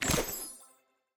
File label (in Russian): Получение награды